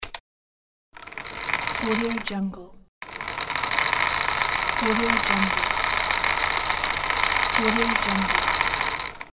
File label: sewing machine